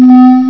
slot siren; Boxcars Casino

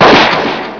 boom; Critical Mass

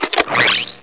attach battery

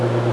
Air wave, air Thing; Yipe! III; Monsters

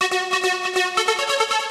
plasma, 140bpm keyboard; hous-tec

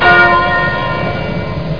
gong; WinDesk 95 - An MPC Encyclopedia

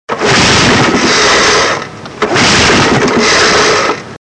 cranking, miscellaneous sounds; Sound Effects for Multimedia - Volume 1

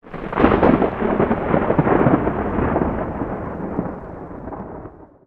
Thunder Strike; 1944 Battle Of The Bulge

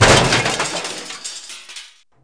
trash; Kingpin

